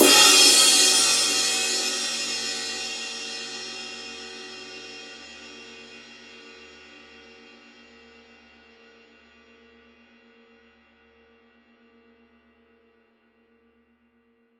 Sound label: 22 reflector bell ride edge; Forgées à partir d’un alliage secret de bronze; signature; paiste